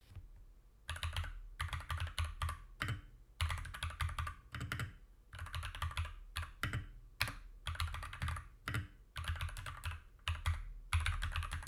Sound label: akko 5087b plus steam engine, review